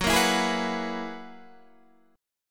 Gb 11th, Guitar, Open D

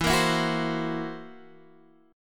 Fsharp 7th Flat 5th, guitar chord

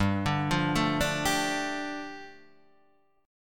G 7th Suspended 2nd G

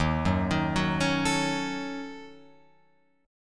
Eb Minor 7th Flat 5th, Guitar Open F; Ebm7b5 arpeggiated

